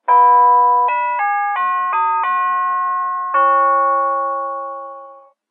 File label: ball game, door chimes